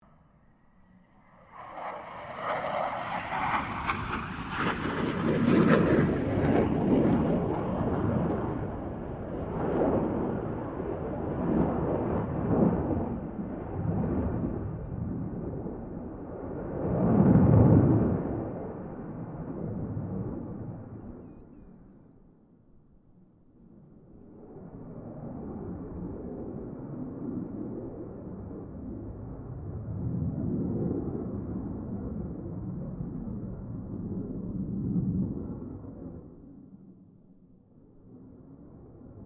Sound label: AIRCRAFT FA-18 HORNET PASS